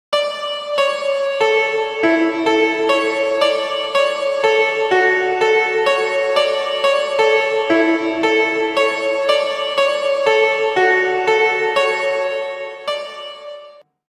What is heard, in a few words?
piano rev